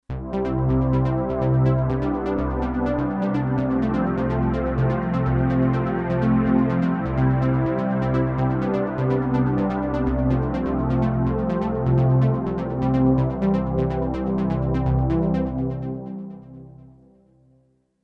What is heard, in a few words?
Atmosph Intro